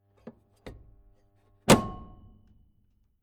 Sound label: Industrial Large Electrical Mains Power Lever Switch Off Metallic Impact; Stinger Big Reverb; Tom Hutchings